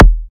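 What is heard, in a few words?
Synth Bass Drum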